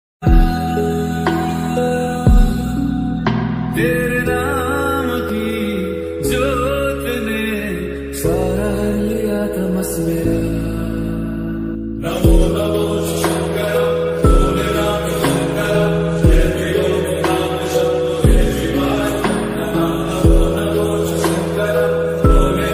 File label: bhakti ringtone, hindi ringtone; shiv; mahakal